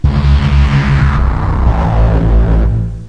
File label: bass